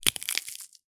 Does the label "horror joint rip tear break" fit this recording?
Yes